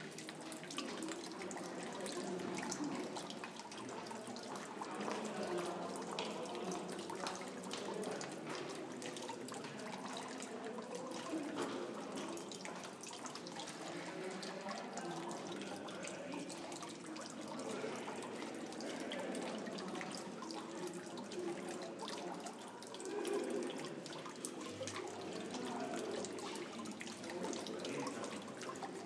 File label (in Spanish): fuente del claustro de la catedral de girona